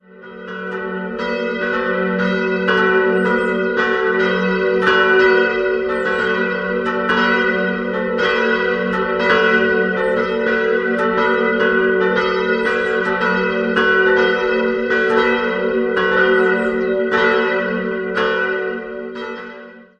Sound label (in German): Nennslingen, Evang. Pfarrkirche, Glocken; Evang. Pfarrkirche Beatae Mariae Virginis; mittlere 1565 von Gabriel Hirder in Nürnberg und Albert Bachert (Karlsruhe) ergänzte 1990 die kleine Glocke.